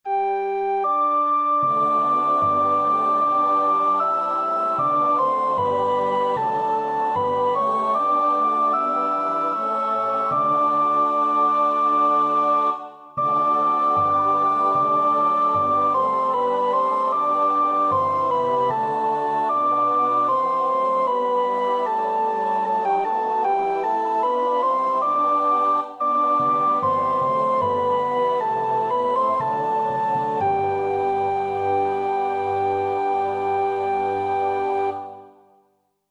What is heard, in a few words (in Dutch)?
sopraan; Bach (Johann Sebastiaan); BWV 195